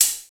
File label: Smooth Hi Hat Closed Sound A sharp